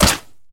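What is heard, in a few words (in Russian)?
хлопок дверьми при закрытии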